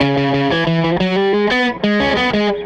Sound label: Strat Guitar Loop